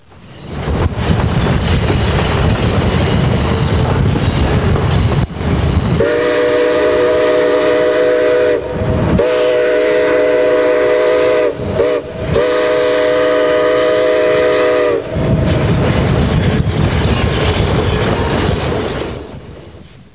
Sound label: Steam; ope 19a